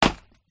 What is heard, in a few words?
industrial axe chop strike wood single; zapsplat